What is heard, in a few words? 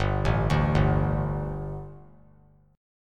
G Suspended 2nd G, down; Gsus2 arpeggiated; JGuitar